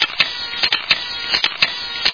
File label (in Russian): seriya fotografij na polaroid